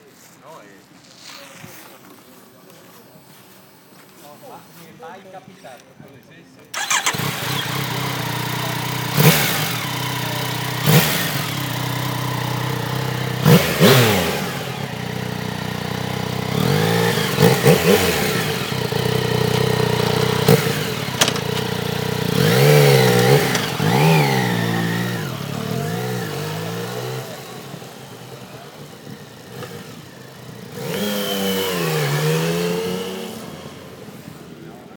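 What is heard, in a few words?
Yamaha MT-09 Tracer action, motorcycle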